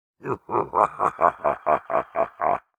laugh, mech; lin gang; adan mech

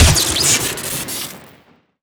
sniper smg crit; weapons; tf2

